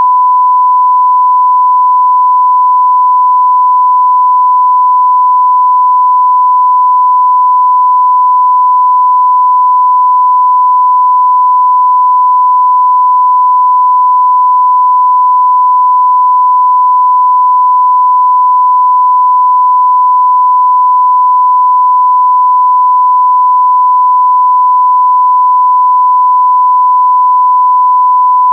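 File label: sine 1000 Hz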